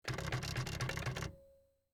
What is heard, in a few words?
typewriter backspace